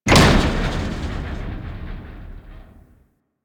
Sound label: Ship Hull Impact Medium; Hit Effects; Warframe; Railjack Hull Hit Effects